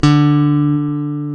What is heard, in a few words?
BASSES, Roland